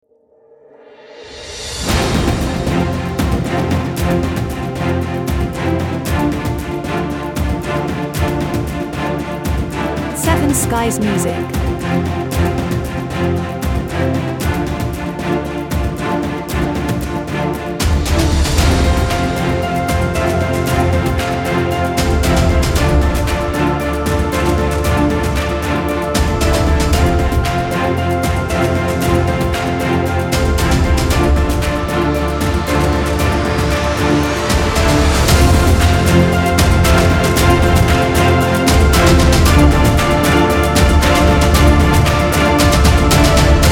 Epic Victory (Half Without Horn)

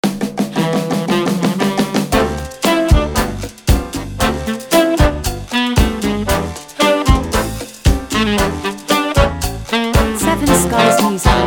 Optimistic Ballroom

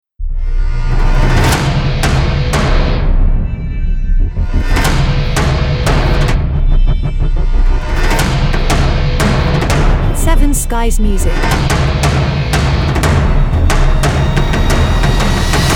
Action Drums Teaser

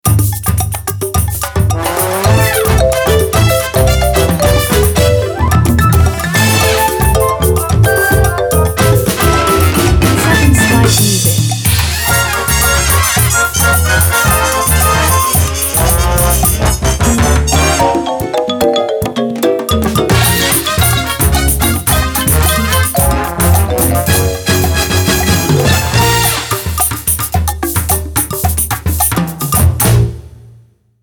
Mambo TV Theme

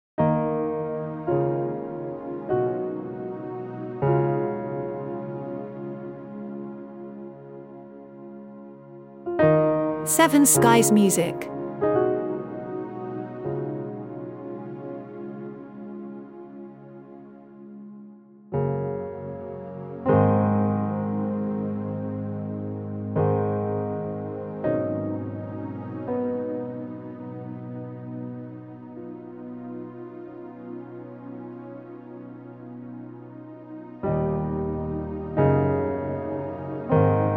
solo piano

